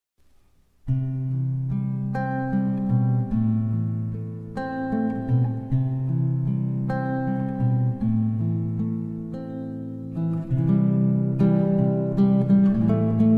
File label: fingerstyle